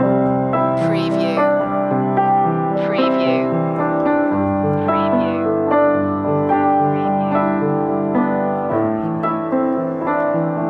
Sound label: right here with you loop